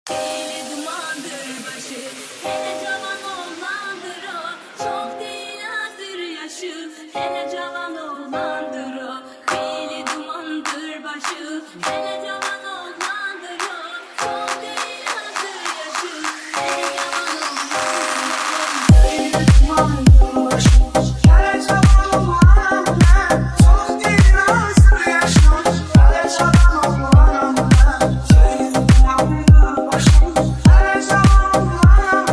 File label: remix